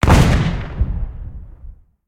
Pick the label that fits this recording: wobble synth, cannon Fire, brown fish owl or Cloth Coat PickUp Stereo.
cannon Fire